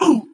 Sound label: ballbot hot laser